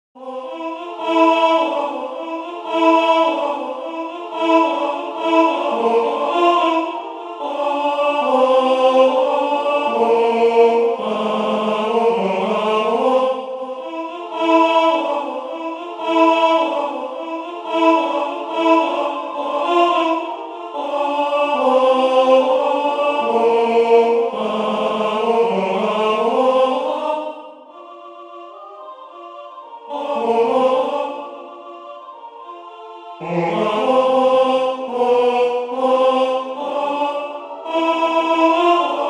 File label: tenor; WSS